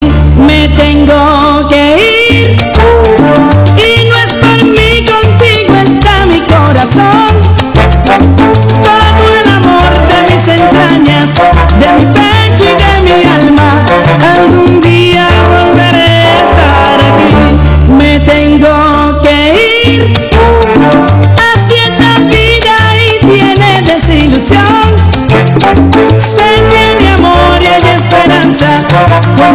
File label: salsa; fortnite emotes